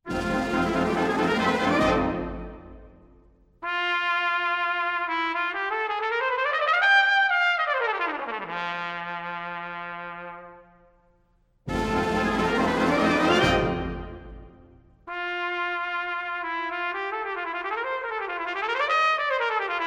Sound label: Trumpet Polka